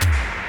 NOIZ E.TOM, DANCE KIT; Crypton - DrumBase5000; DANCE KIT #3

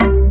DM ORG1, Dance Mega Synth

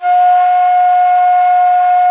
F#4 FL, FLUTE; AKAI S-Series CD-ROM Sound Library VOL-7

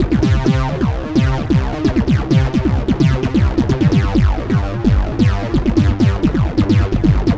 Tech Bass; Dr. Fink's Funk Factory

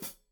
Hat Foot, Hi-Hat, Cymbals Stereo

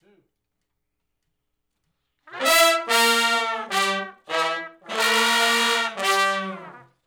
R B Riff (G) unison, Horncraft; Sections

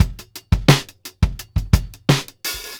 Beat Loops; Zero-G; Monster Beats

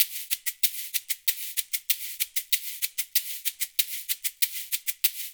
LOOP, Percussion, FUNK

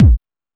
Techno B, Drum Machines, EMU XL-7

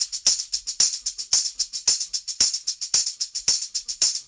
Raw Funk Tambourine, 70s Analog Funk; Sonic Foundry (Sony Creative Software); What it Is! 70s Analog Funk

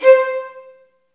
STR VNB2, Vlns Marc; Roland L-CD702; Vlns Marc1-3

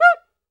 QUICA HI, Latin Hand Perc; E-MU Producer Series Vol. 2; More Studio Essentials; Composer

